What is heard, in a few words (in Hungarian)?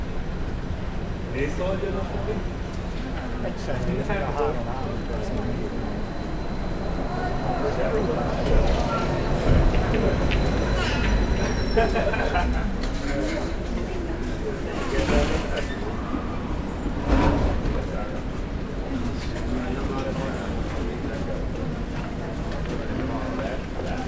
vegallomas ket troli erkezik es megy; jaszai mari teri vegallomas